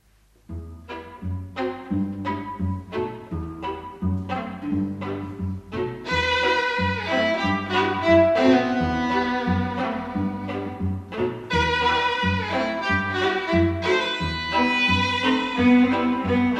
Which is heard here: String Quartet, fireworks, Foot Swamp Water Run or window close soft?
String Quartet